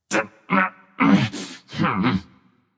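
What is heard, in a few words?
nonverbal grumble; smite; voicepack; merlin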